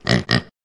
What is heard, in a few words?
Pig grunting